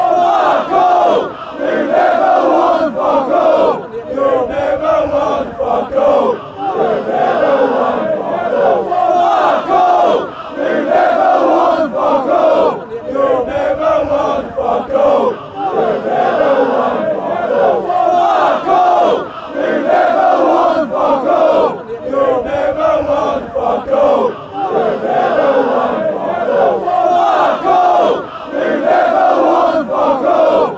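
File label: youve never won fuck all, football chant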